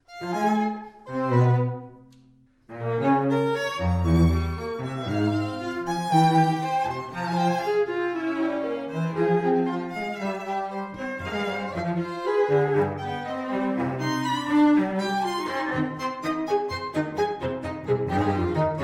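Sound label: string trio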